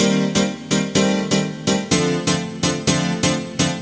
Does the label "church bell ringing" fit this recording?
No